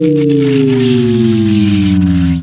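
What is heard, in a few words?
Powerdown; Emperor - Battle for Dune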